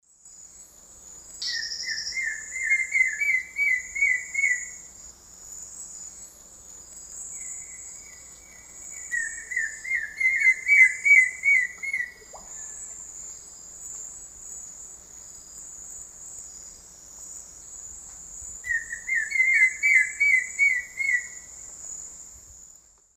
Myrmeciza melanoceps, duet; S. America; Eastern Lowlands

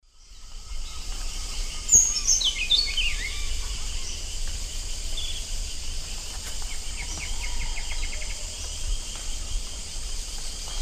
Saltator maximus, alternate song; S. America; Eastern Lowlands

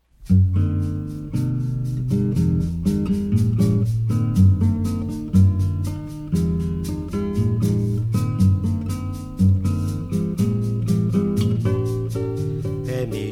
Bossa nova Brasil

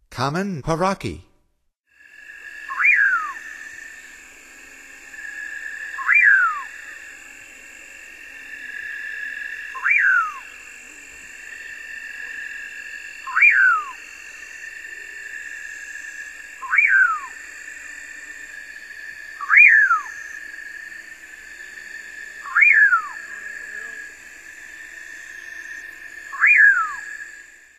Common Pauraque; North America; Stokes

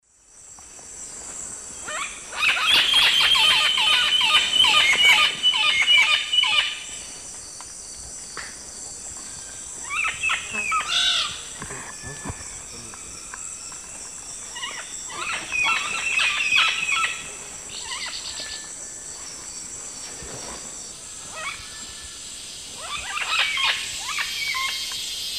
Deroptyus accipitrinus, calls; S. America; Eastern Lowlands

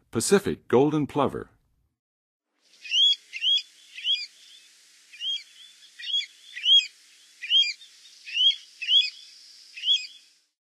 Pacific Golden-plover, Birds of North America; Western USA; Western USA Songbirds

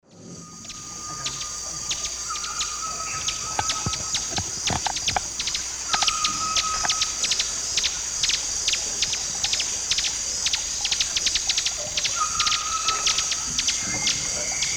Topaza pyra, calls and song; S. America; Eastern Lowlands

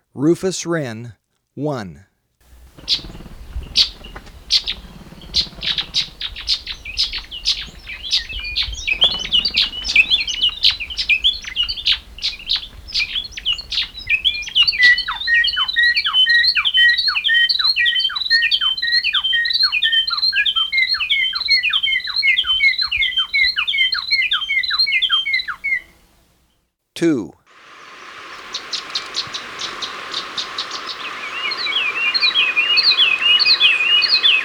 Rufous Wren, Birds of Ecuador; S. America

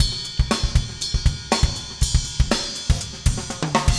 funk 120 bpm, loops

